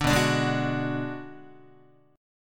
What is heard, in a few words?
B Minor 6th B, Guitar; Bm6 strummed; JGuitar